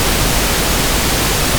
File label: Pink Noise